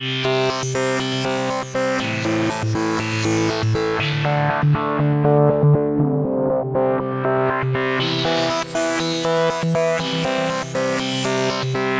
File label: dreamscape; DJ Booth; effects